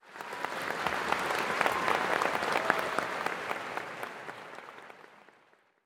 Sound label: win melody; sudoku 2